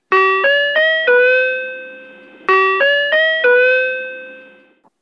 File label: citybus door close